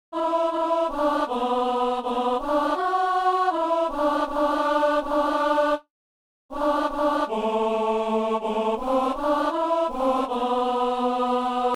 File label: alto; Psaume 71; Messe du 17 janvier à Saint-Denis